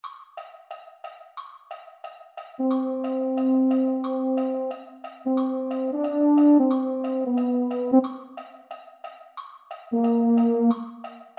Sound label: baritone; holiday brass